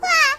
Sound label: wah